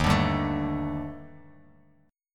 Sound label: Eb Major Sharp 11th Eb Bass Standard; EbM#11; strummed; 11,17,5,14; JGuitar